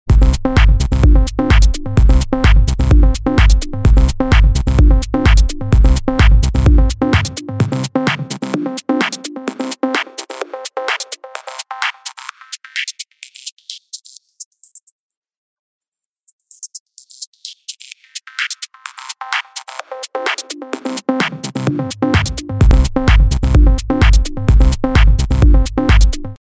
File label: Loop Low High Pass Filter